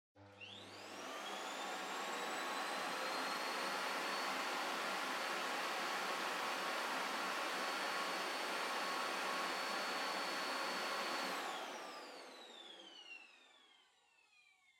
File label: Vacuum Cleaner Far Vacuum High Long